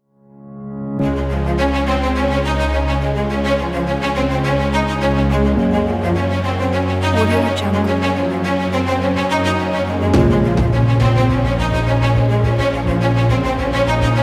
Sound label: violins; danastudio